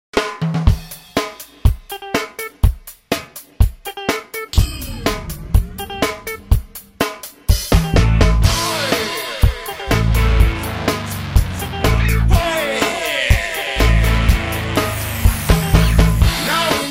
Reggae Band; Columbus

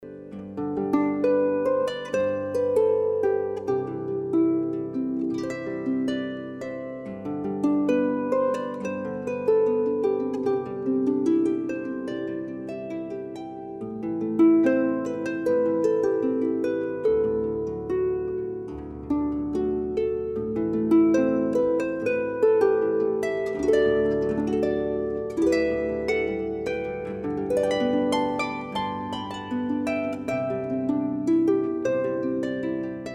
Harpist; Orlando

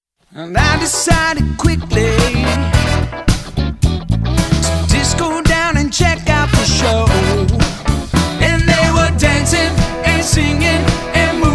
Wedding Band; Nashville

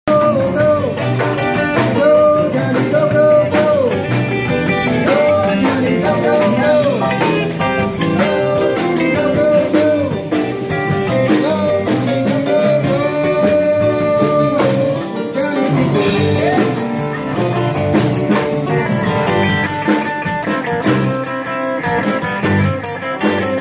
cover band

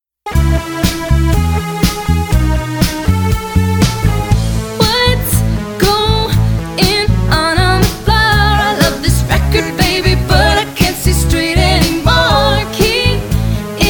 Wedding Band; Nashville

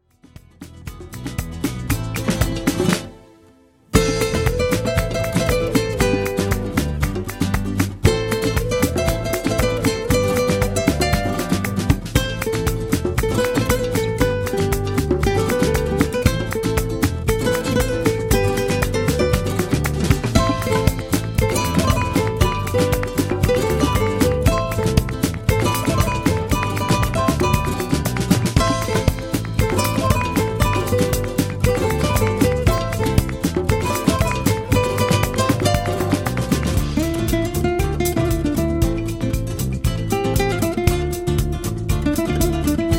Flamenco Guitarist; Atlanta

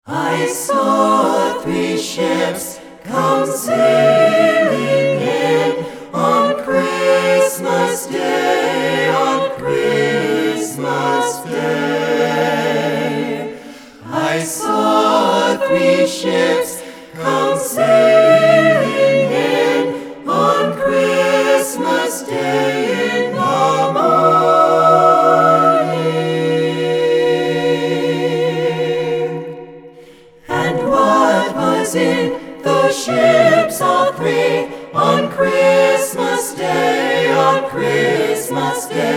Christmas Carolers; Seattle

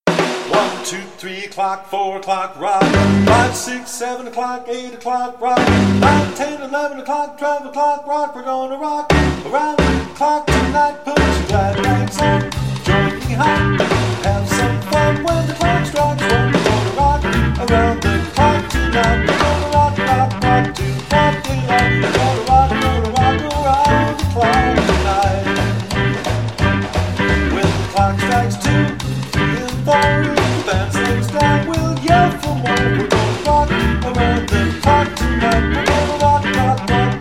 Cover Band; Omaha

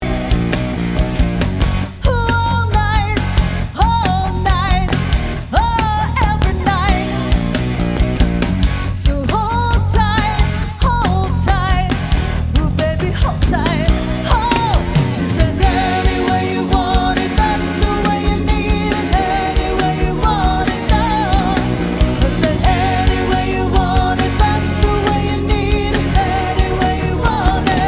Cover Band

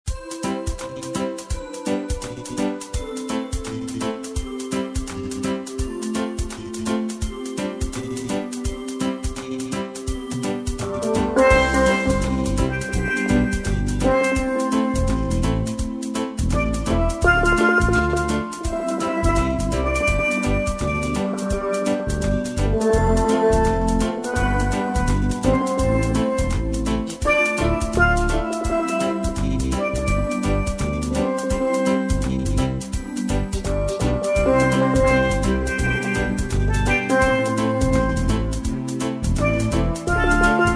Steel Drum Player; Boston

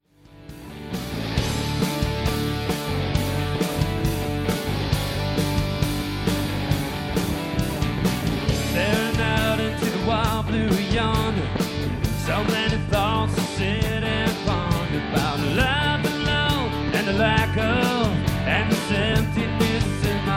Tribute Band

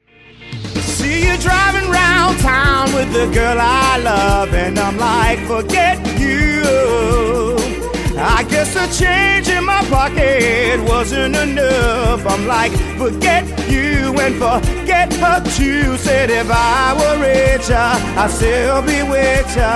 Party Band

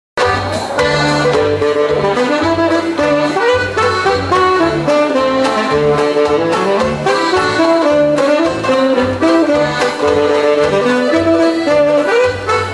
German Band, Polka; Seattle

